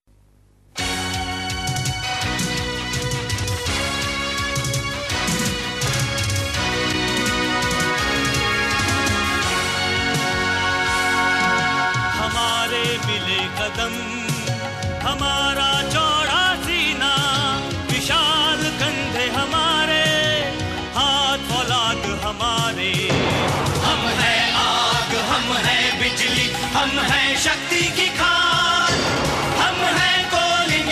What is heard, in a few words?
CORPORATE GEET; CIL